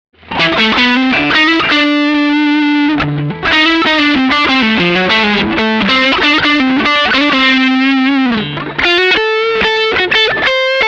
English Muffy Char. 12h Muff on Sustain 12h Tone 14h; Tech 21 SansAmp